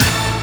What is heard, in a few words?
Back Hit, Roland JV-2080